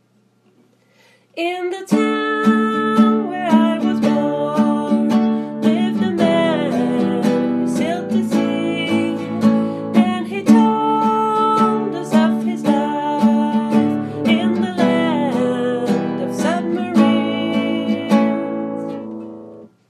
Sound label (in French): Couplet Chanté